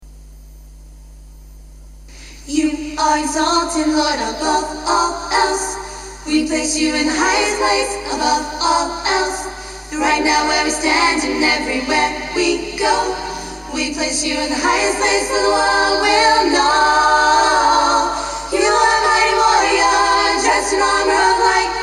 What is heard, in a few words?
music english